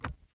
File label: footsteps Metal